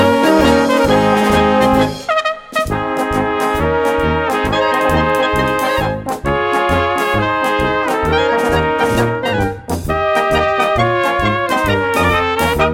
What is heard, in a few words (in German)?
Polka, alpenbrass